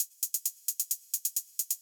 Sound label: Beat Closed Hats, Belgium Beats 132bpm; Big Fish Audio - Old Skool House; OSH Construction Kits